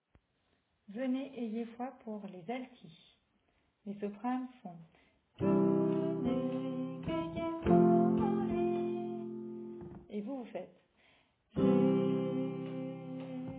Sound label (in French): Alto